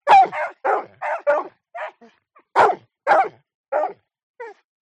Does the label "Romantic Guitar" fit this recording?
No